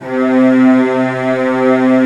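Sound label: CELLOS D3, Strings; ILIO; Synclavier Strings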